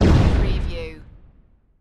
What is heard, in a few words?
Explosion Grenade; Action, Impacts & Explosions